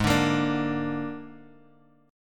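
Ab Suspended 2nd Flat 5th chord, Guitar, Drop D tuning; down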